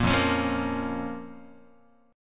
F Suspended 4th Sharp 5th, down, Guitar; strummed; JGuitar

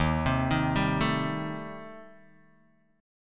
Eb 6th Flat 5th, Guitar, Open D

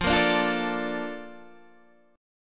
A sharp Major 7th

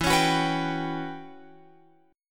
E Minor 6th Add 9th guitar chord, Open F; Em6add9 strummed; JGuitar

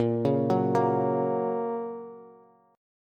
Bb Minor Sharp 5th, Bass; 18,16,16,15; arpeggiated; chord shape

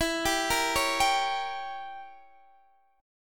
E Diminished 7th E down Guitar Open D

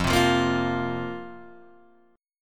F Suspended 4th F, Guitar Open G

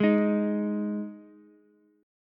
Ab 5th Ab Ukulele; strummed; JGuitar

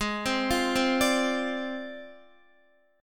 Ab 6th Flat 5th Ab, Guitar; Ab6b5 arpeggiated; JGuitar

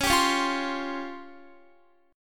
C sharp Major 7th Flat 5th Guitar; C#M7b5; strummed

